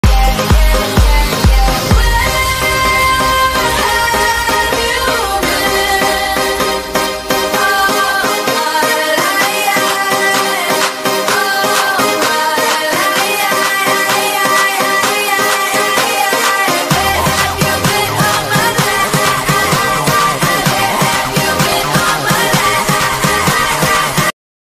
english ringtone

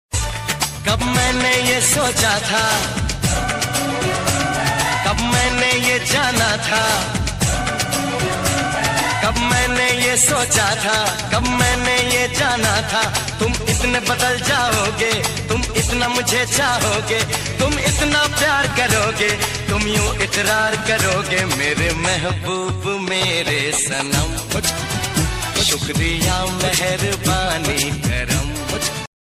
male hindi ringtone

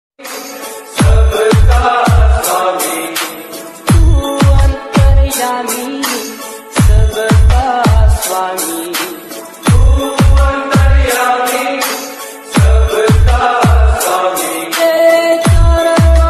Devotional ringtone, hindi devotional ringtone

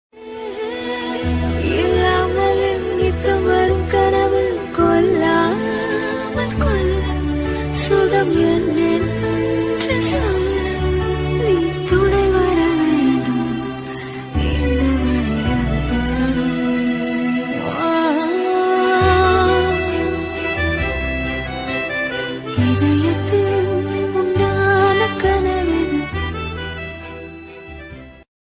Romantic tamil song, tamil ringtone

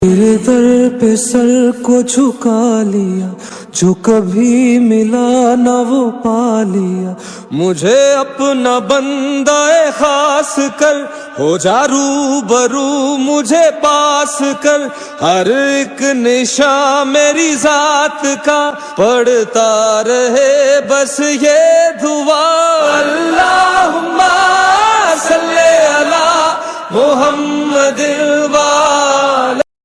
islamic ringtone

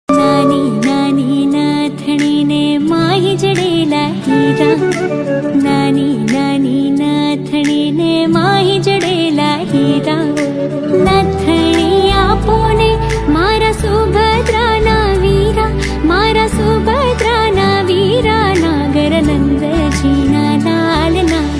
gujarati ringtone

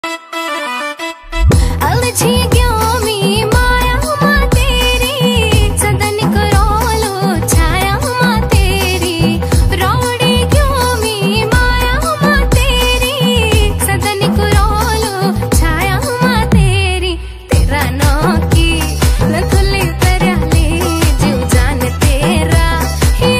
uttrakhandi ringtone

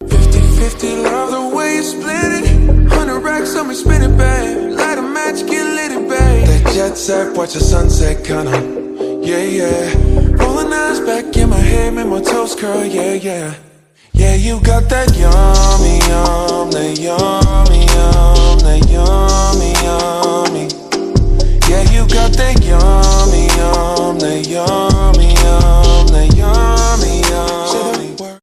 slowed english ringtone

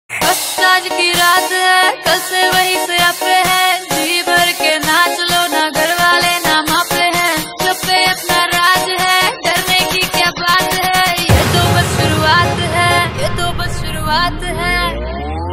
ringtone

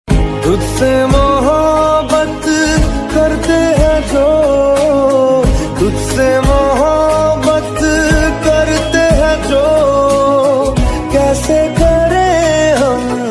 Bollywood Hindi Ringtone

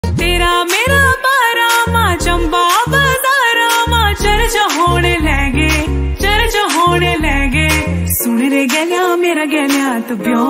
uttrakhandi ringtone

